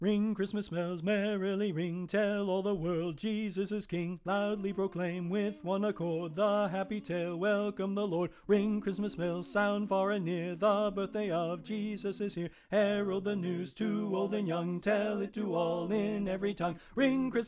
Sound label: Soprano Left